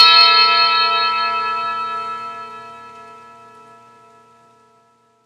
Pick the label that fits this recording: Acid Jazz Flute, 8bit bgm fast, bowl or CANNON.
bowl